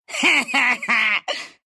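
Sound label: laugh, Russian; dota2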